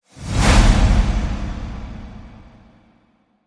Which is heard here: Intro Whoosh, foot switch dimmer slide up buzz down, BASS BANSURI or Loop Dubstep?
Intro Whoosh